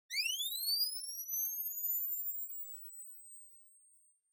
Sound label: Camera Instant (Flash Charge)